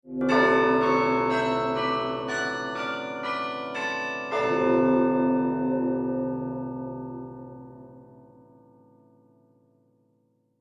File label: Melodic church bells sunday ceremonial